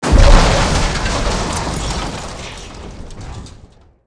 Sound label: CRASH